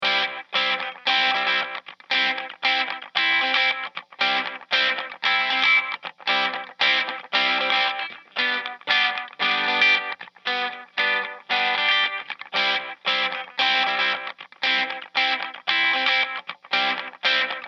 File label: Slap Solo, delay; Skreddy